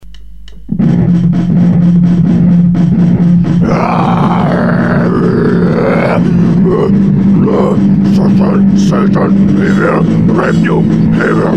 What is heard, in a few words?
hellish washing machine